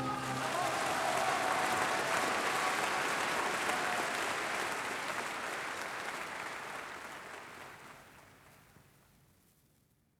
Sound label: Applause